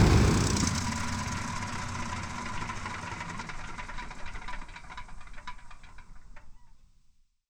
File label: Aircrafts Engines Packard Merlin Prop Cockpit Shutdown In; DCS